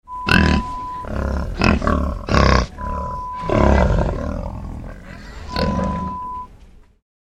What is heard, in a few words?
Pig sounds